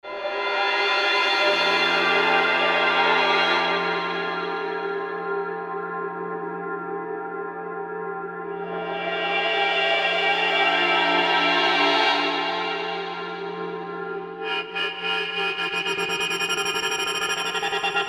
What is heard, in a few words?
X-Ray Pad